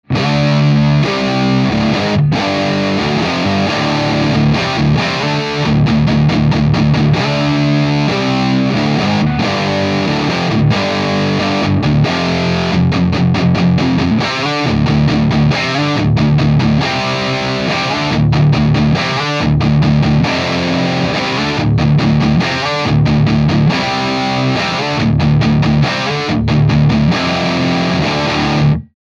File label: Terror Stamp Classic Metal